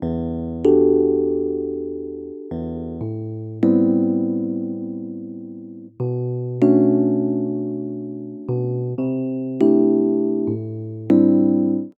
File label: 080 Pop MarkII Emaj, E-Piano; Pop Rock; E-PianoClav; Pro Tools Essential Loops; Digidesign